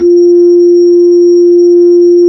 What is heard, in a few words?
B-3, Hollow